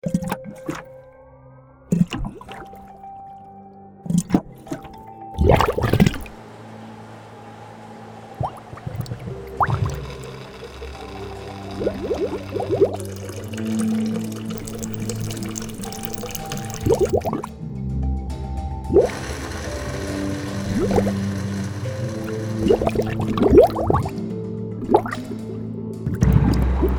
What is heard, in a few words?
Bubbles Water & Aquariums